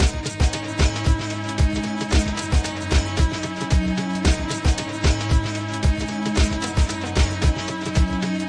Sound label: 113 echoey drone dance; SAMPLED MUSIC LOOPS